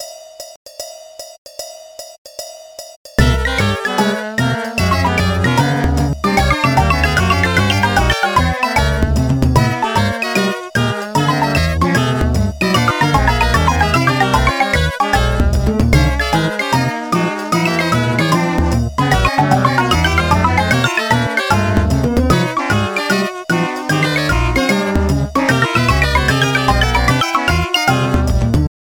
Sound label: COOL SAILOR JAZZ